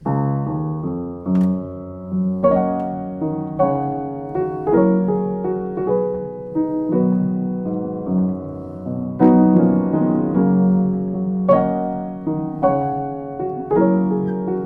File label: Richter, piano